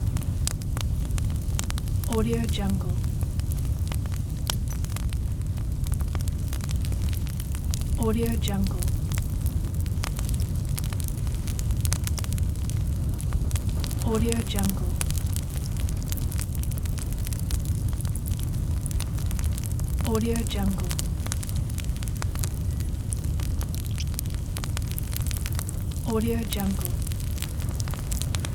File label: big fire crackling loop